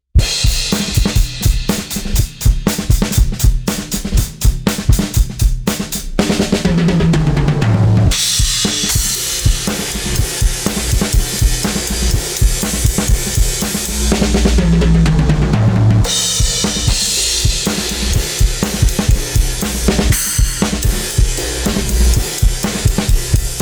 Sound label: Deep Bass; Material comp Glue